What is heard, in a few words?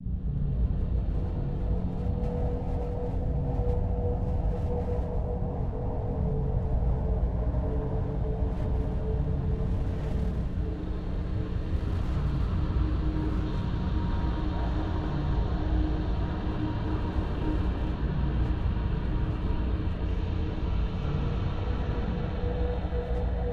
genesis effect; artifacts